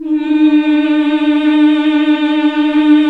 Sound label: MMH D#2, CLASSIC VOX